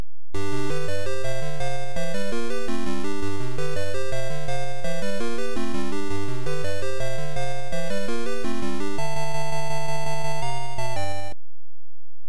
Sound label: ending; kung fu master